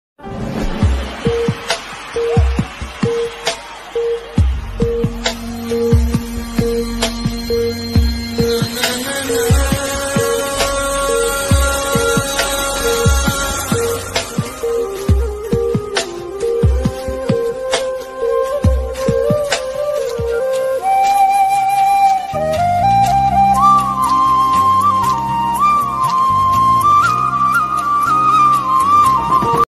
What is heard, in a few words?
mass bgm ringtone